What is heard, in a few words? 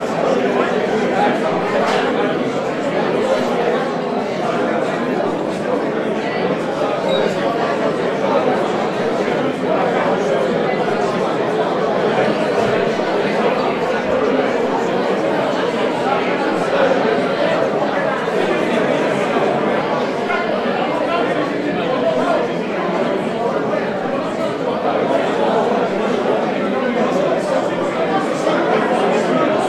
ambience bar large busy